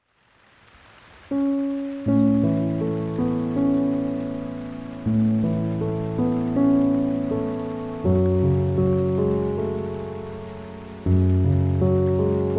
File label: Piano, With Rain